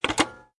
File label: telephone pickup